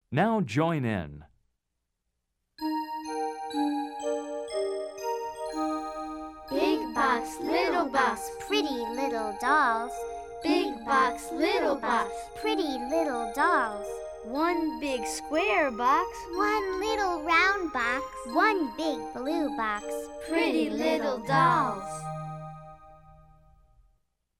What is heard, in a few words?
Big Box, Little Box, Group, chants; logos english; Let's Chant, Let's Sing 1